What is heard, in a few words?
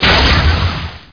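pellet explosion; MOTS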